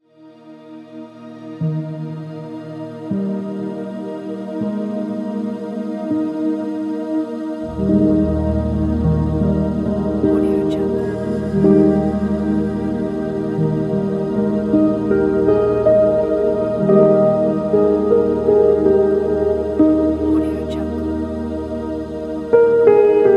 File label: hope inspiring atmospheric piano